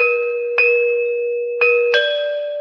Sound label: call of the bells, Electronic Loops